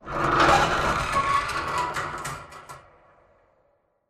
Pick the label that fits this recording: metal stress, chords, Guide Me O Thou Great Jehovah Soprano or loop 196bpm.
metal stress